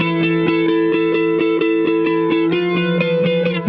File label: Cigar Flange 130 E, guitar drone